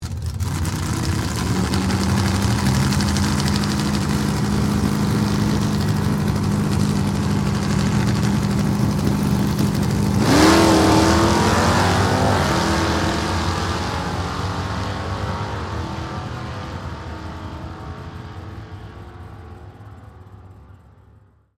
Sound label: Dragster Away, Sports, Dragster, Drag, Away, Two, Cars; Race Cars; Vehicles; BlastWave